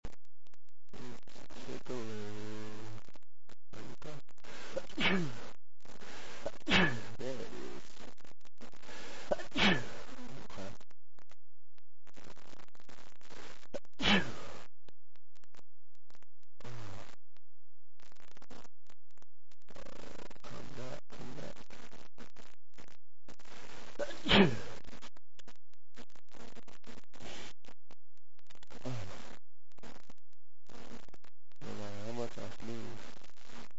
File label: more itch, male